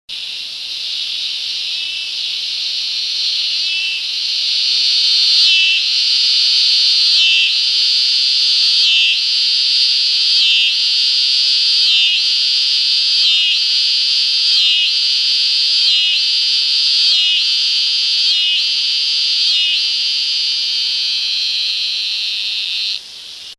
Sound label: cicada; T latifasciata